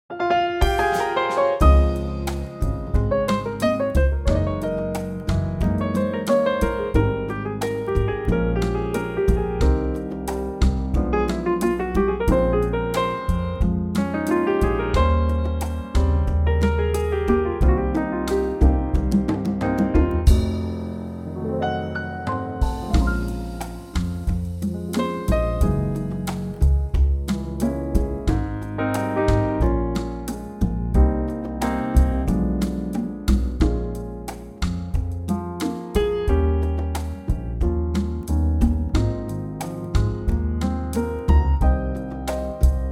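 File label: Ab, backing track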